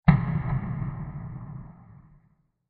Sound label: Large Diffuse Explosion; Explosions; Crafted Signals